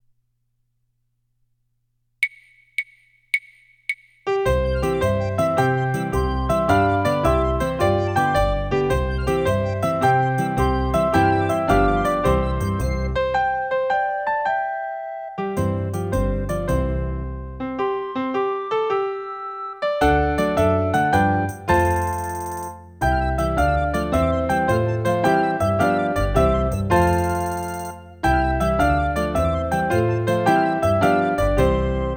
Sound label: SOLO W ACCOMP